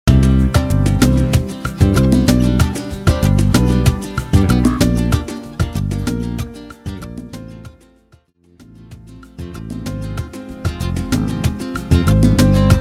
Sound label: Intro Outro Clean 95 BPM Son